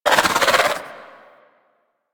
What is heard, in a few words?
Fairy Dust Cast; DE; Warframe